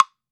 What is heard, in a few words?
Wood Block; AKAI S-Series CD-ROM Sound Library VOL-5